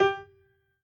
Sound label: piano hi